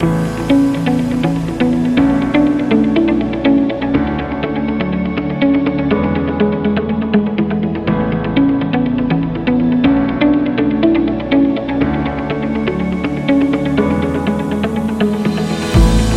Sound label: Upbeat Corporate; corporation